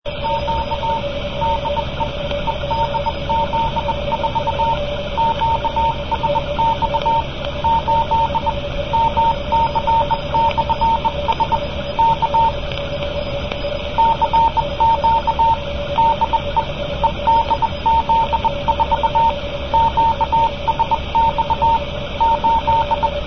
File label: cw